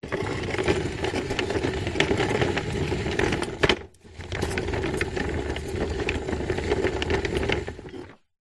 industrial wooden cart small roll hard ground long